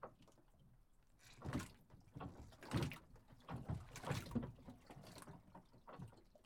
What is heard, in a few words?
docks; baileywiki maps premium towns